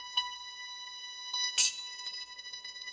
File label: correct ding; sound effects